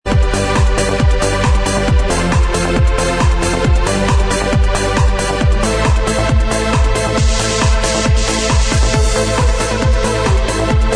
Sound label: Inst Track, backing tracks